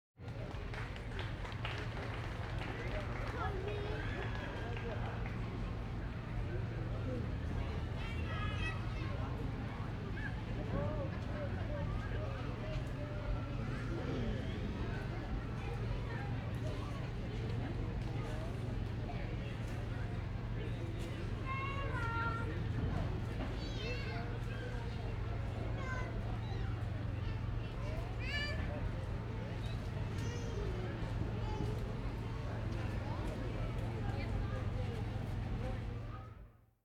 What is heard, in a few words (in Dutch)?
Jaarmarkt; KMP Film Video Sound Effects; vrije tijd